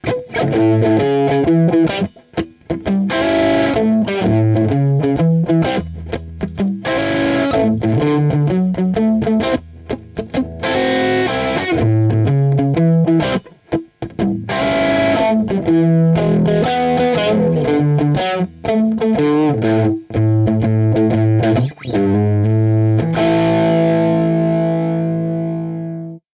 harley benton te-90flt vw bridge gain riff

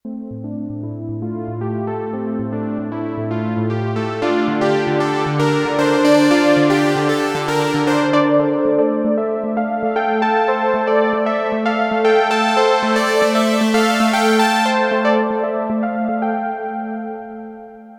poly lead; novation peak test

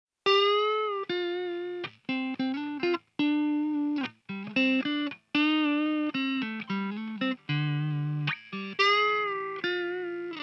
Solo Amp; Apollo Twin; funky Blues-Gitarre

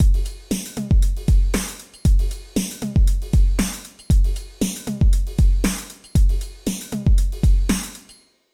Alesis SamplePad Pro

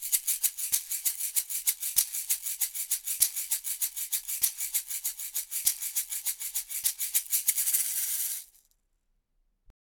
shaker

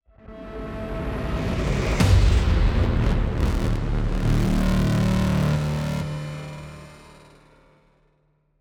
hit; heavyocity gravity

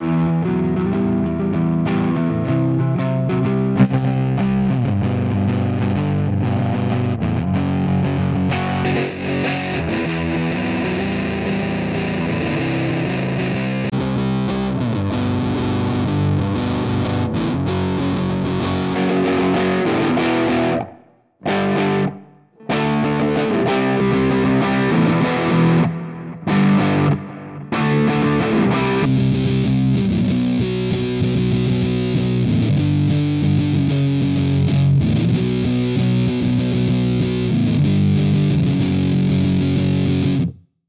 guitar rig6 driven

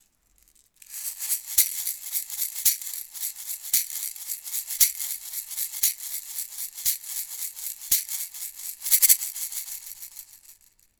img stageline ecms-50usb shaker; img stageline ecms-50usb test

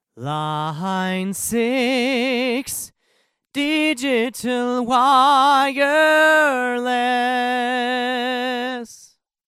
sm58 line6; Line 6 XD-V70

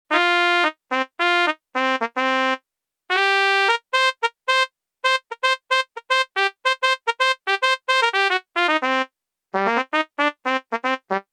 Casio SA 80; Trumpet

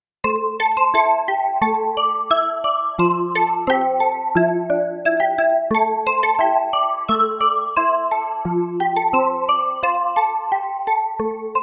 nord lead a1 chinaknuckles

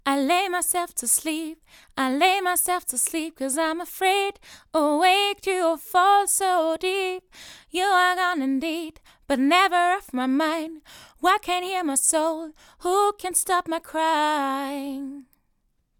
vocals; akg c214

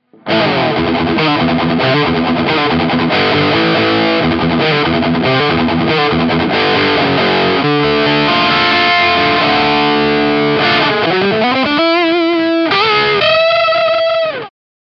high gain; kammer tiny k test

